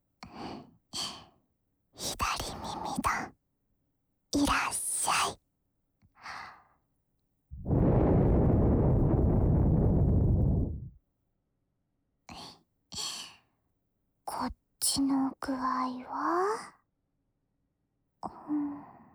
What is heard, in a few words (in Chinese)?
涂抹乳液后用糙叶树木制掏耳勺+绵制梵天进行主流系掏耳(左耳), ASMR; Rail Works 虎子